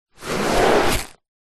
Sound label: bag teachers fabric containing books and paperwork drag on ground; foley